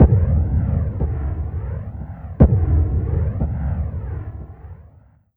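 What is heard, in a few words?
PARKY bass drum, drum loop; Big Fish Audio; Uncivilised G'rooves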